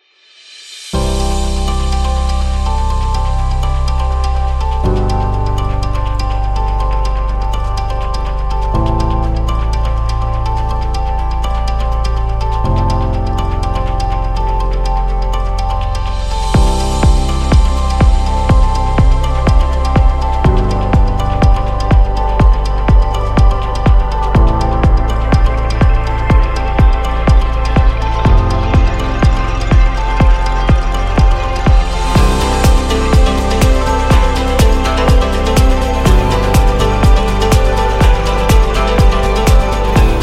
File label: Asian Beat